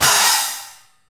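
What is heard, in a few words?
cymbal, Trash; Roland L-CD701; Trash+Kitch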